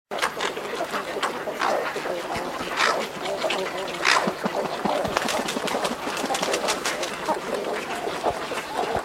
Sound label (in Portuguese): garça-vaqueira